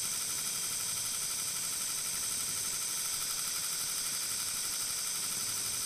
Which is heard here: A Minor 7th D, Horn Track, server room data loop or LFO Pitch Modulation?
server room data loop